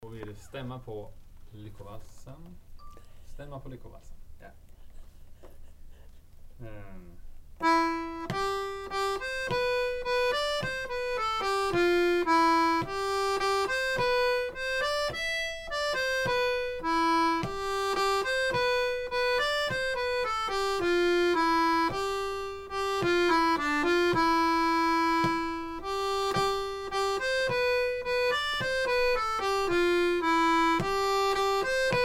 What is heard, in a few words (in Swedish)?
Stämma, Lyckomarschen